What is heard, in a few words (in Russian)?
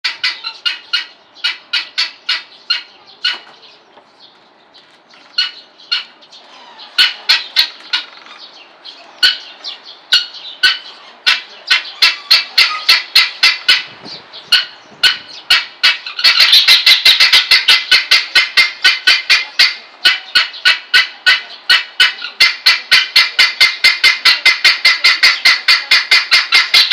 golos cesarki